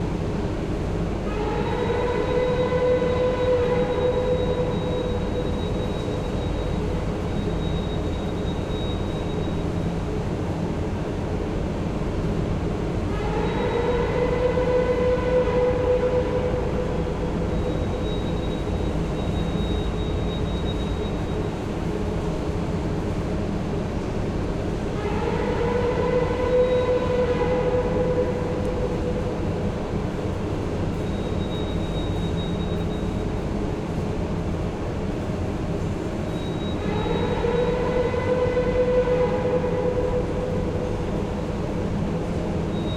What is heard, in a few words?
Industrial, Ironworks, Machining shop, Ambient noise; Mononeshot